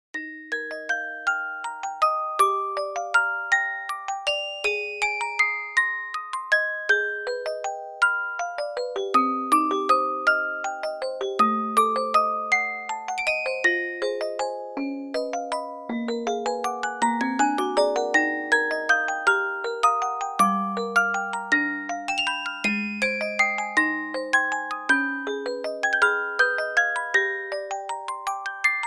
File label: Lullaby of the End (Music Box Version); Super Mario Odyssey; Fossil Falls